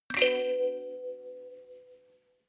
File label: notification kalimba rattle slide minor